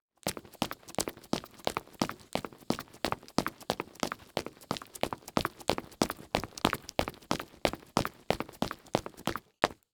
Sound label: Foley Footstep Hard Sole Run On Cement; Foley Footsteps